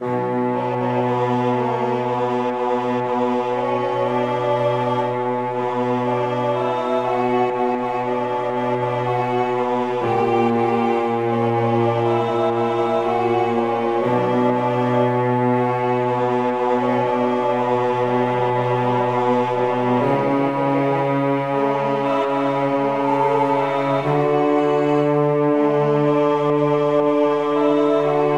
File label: Recitativo; Bach; Christmas Oratorio